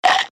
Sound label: Bite